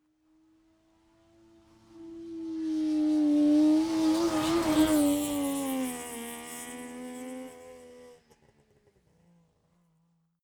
car saab 92 exterior pass right to left

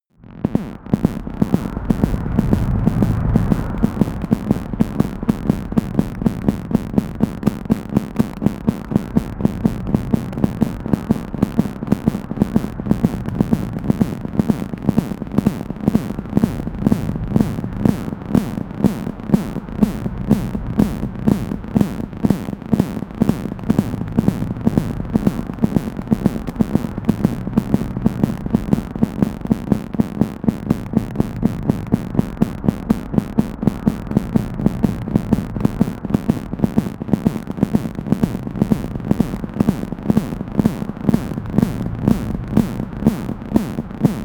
atmosphere noisy heartbeat, digital

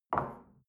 shell place on table soft short; soundpool

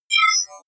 confirm; maschinen; signale; soundpool

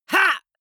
male fight shout short; comedy-cartoon; soundpool

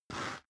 step boots concrete grit slide short; Footsteps; schritte

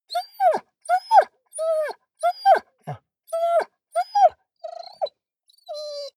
dog whine; natur-tiere; hunde

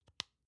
lotion lid close soft; friseur; berufe

